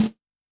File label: Roll Em, Yamaha DTXpress-II snare